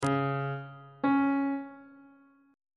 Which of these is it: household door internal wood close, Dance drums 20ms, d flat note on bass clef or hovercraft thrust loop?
d flat note on bass clef